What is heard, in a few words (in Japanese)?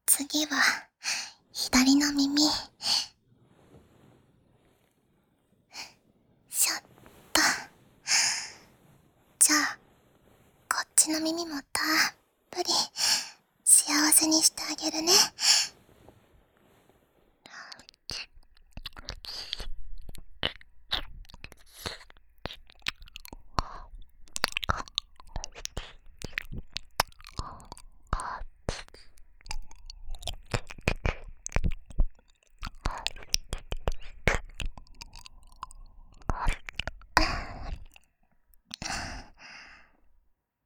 耳なめ（左）